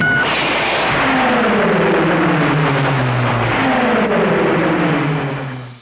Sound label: turbo Boost; Knight Rider